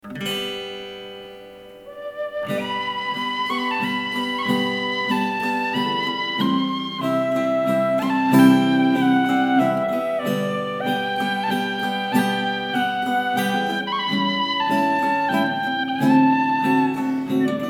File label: folk tinwhistle